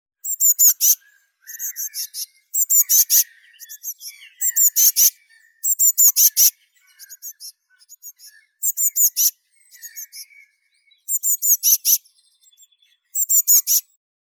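blue tit Cyanistes caeruleus big chick, nature sounds; lazorevka; bolshoi ptenets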